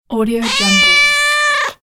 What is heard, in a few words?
cat meow